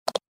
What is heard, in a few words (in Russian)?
klaviatura noutbuka klavisha